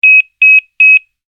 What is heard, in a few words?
beep-beep-beep